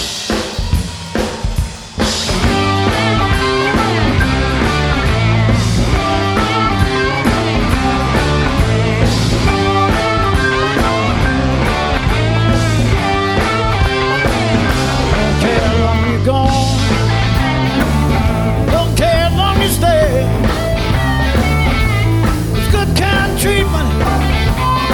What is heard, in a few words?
jazz